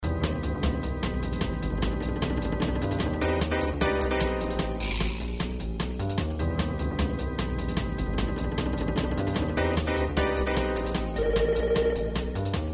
Jazz

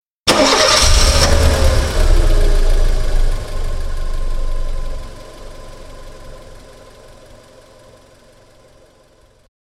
rev

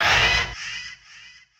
big Stab

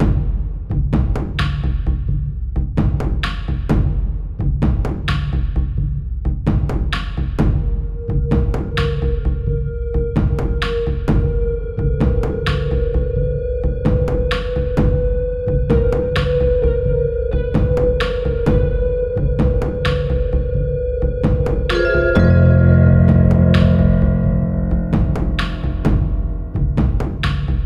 confrontation

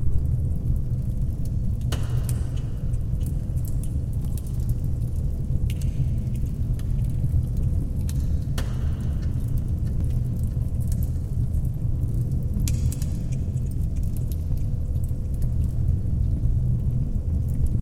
bonfire

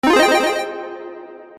confirm

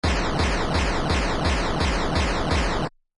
boss die

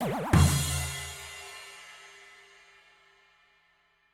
badum

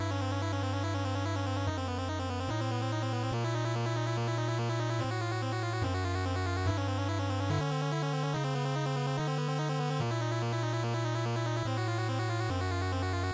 bossfight

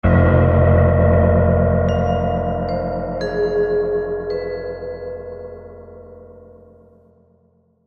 Sting

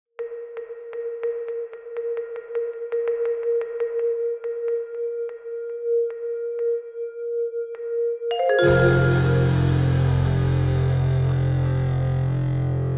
pan up

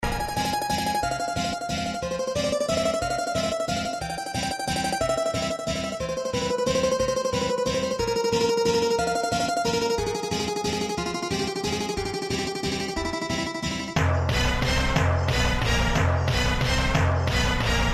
witch full

